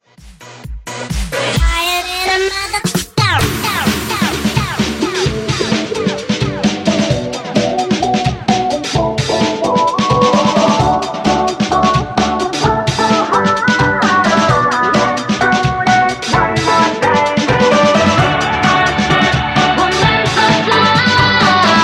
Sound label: Funk 130Bpm